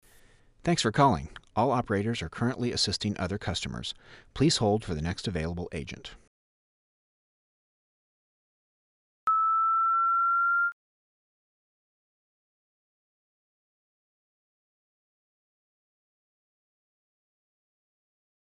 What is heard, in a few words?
sine